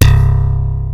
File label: DIGI-SLAP; Rubber Chicken Software Sample Bank